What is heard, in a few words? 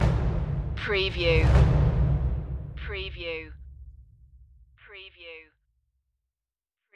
perc stinger